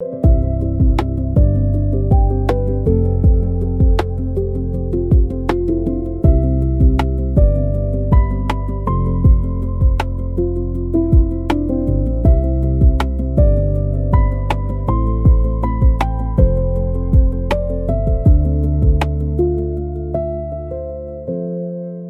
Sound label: loop